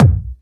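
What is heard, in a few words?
hard bass drums; Bazzdrumz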